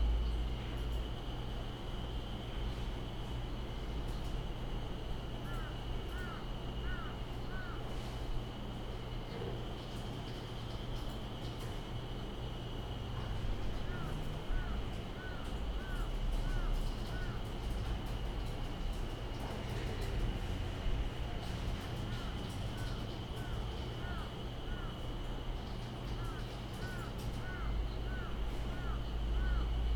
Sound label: indoor townhall evening; anemoi